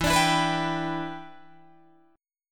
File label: E Minor 7th, down, Guitar, DADGAD; Em7; strummed